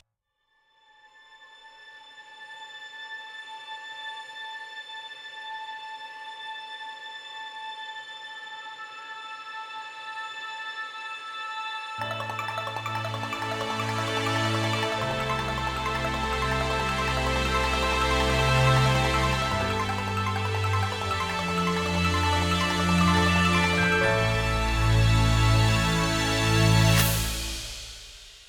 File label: bit forrest stinger